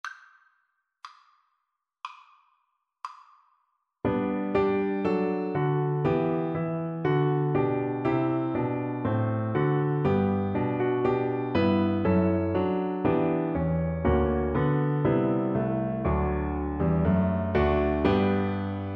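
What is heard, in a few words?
recorder karaoke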